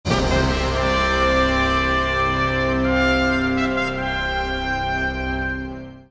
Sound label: theme; Star Trek Deep Space Nine